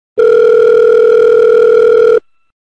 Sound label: internal ringing; clipped